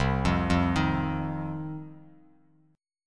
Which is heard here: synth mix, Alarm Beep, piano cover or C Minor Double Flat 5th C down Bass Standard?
C Minor Double Flat 5th C down Bass Standard